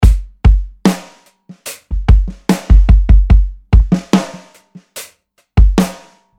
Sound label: HONEY RINGER LOOP